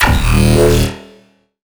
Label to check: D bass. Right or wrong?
Right